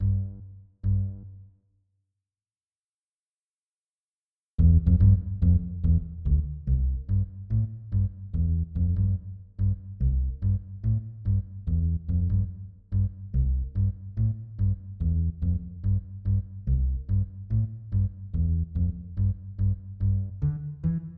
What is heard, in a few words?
Swinging Night soundtrack bass; english